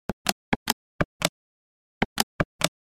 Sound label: Sharp EL 8 old calculator press buttons; gfxsounds